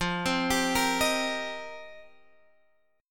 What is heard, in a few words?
F 7th Suspended 2nd Suspended 4th, Guitar Open G